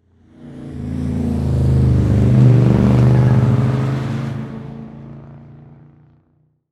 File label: Transportation Car Race Car Drive By Turn Quick Multiple Cars